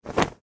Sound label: Plant Flag; Google Snake; Minesweeper